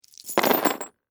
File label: Game Pieces, Drop, On, Board; Sports, Board, Game, Metal, Pieces, Drop, On, Game, Board, Monopoly; Board Game Sound Effects